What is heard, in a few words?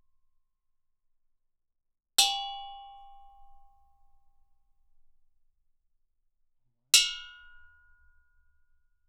Gamelan; Propeller Island - Complete Gamelan